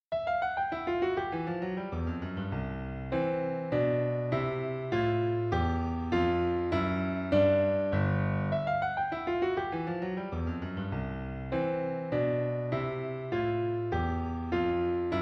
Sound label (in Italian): allegro per pianoforte